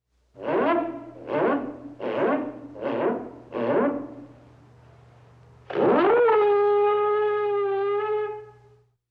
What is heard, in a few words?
Ship Alarm, Under Attack